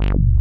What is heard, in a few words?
FAT SYN BS; Pro-Rec - Synthsonic; BASSES